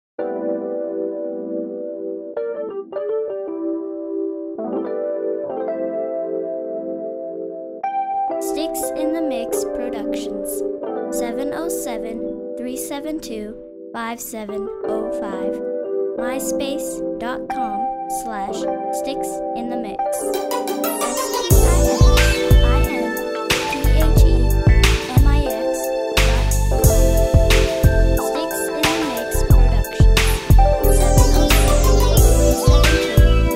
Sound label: electric keys